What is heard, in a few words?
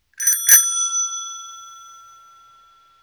Crown Bell